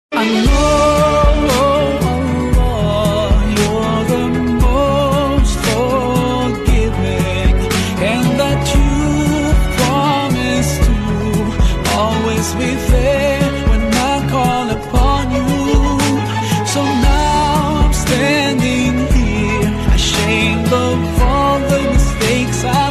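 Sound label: english islamic ringtone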